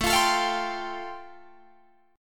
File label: A Minor 7th Sharp 5th A, Guitar, DADGAD; Am7#5; strummed; chordshape; 19,20,19,22,22,22